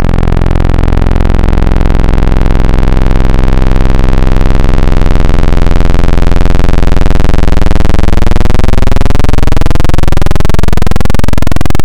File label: Analog PWM Custom, wavetable